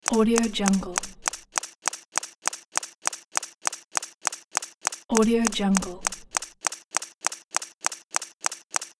really useful data clicks

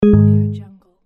denied

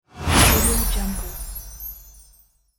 magic chimes whoosh

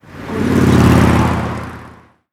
motorcycle passing by

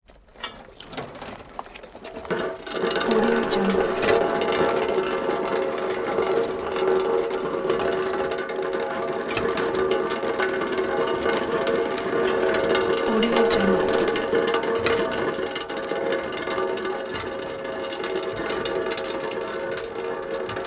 muffler drag chrysler v8 valiant car; prosoundfx

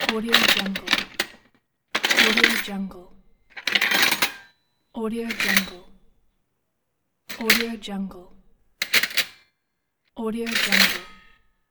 silverware clinking

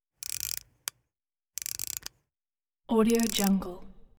camera bell howell 8mm timer winding up fast

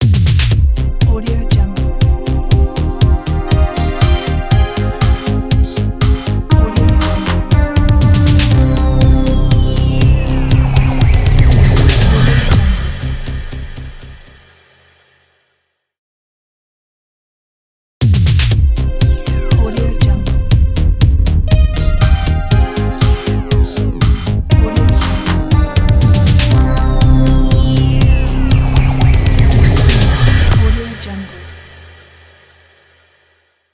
80s techno synth logo

